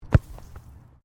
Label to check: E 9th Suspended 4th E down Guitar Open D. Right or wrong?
Wrong